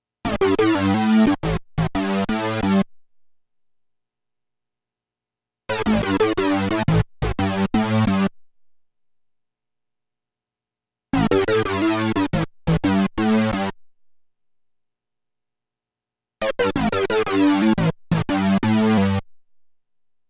Oberheim OB-8 Sync sound

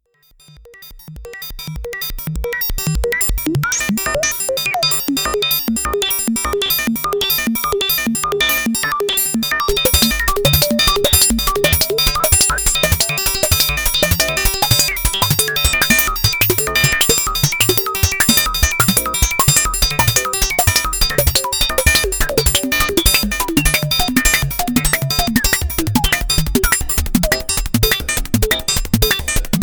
Crazy Effect Arpeggio, synthesizer; Dominion1

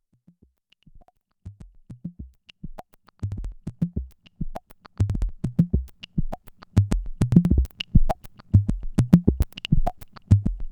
ms20 fx

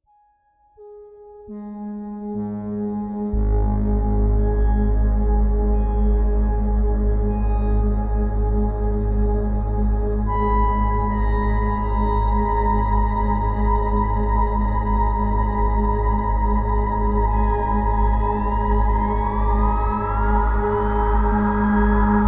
Waldorf Quantum

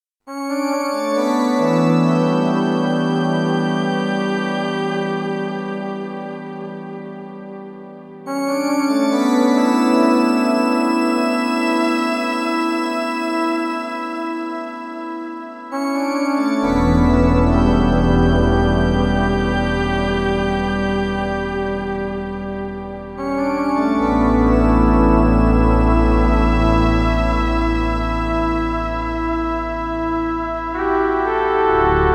jd800

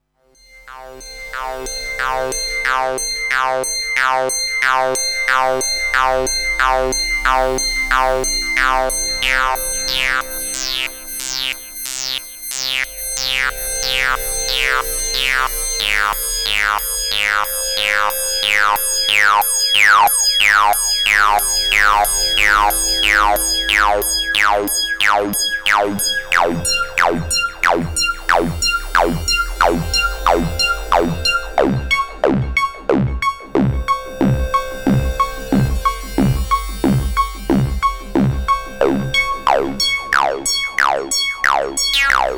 Waldorf Rocket Mono BPF Wavetable; Solaris

